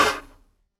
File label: mount horse; Movement